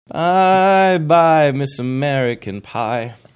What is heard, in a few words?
singing